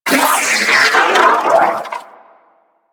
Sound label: Sentient Battalyst Vocal Death; Warframe; Sentients